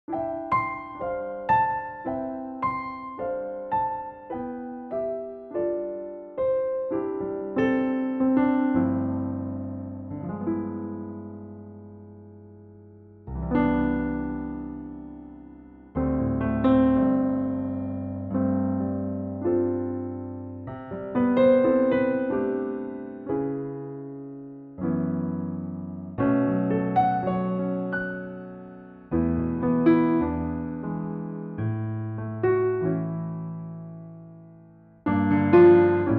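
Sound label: piano track verse; Pianotrak; key - G - vocal range - A to E; Starts with the verse and then into the main theme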